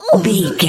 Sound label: voice female hurt pain mild